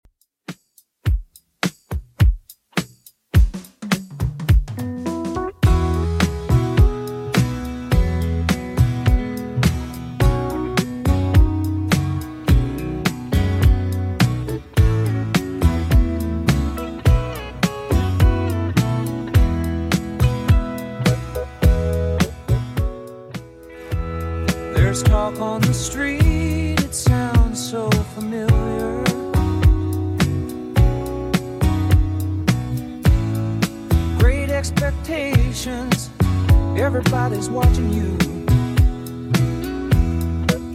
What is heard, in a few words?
70s Redrum 105bpm